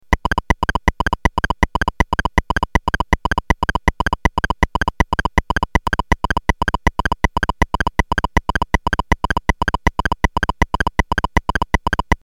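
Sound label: triplets of eighths 160bpm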